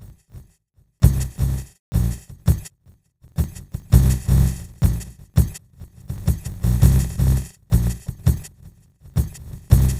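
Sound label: Polyfonic signal separated; Separation of Sound Sources by Convolutive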